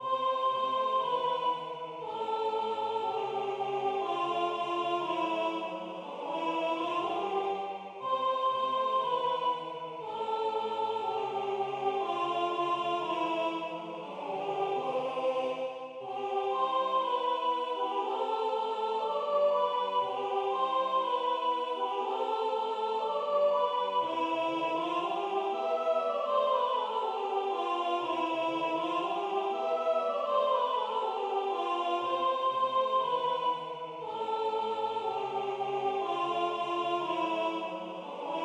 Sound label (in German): Samba bernoise Sopran